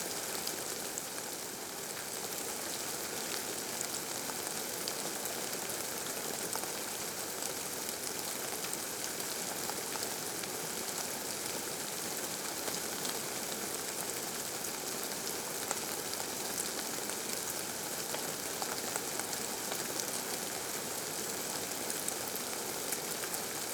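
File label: ambience rain medium; Rust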